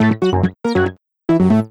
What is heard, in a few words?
TECHNO SYNTH 140; Keys